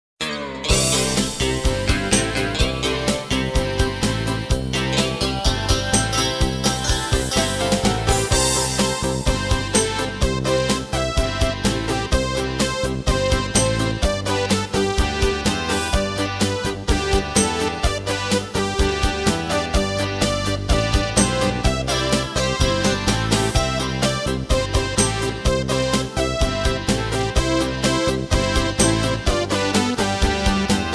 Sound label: Strings; Cagey